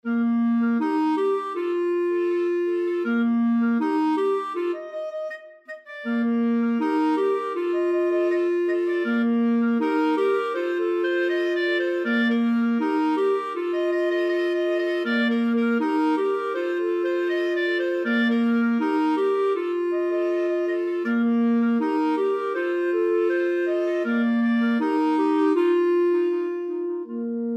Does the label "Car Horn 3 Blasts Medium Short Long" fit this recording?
No